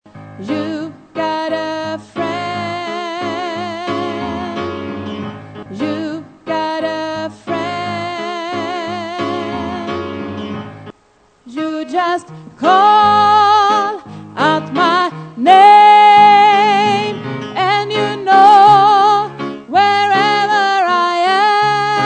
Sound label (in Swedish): sopran